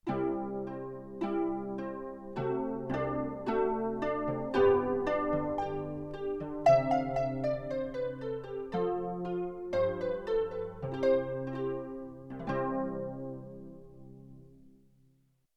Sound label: Artsy-Ballad-Pad, Harp, Yamaha TX16W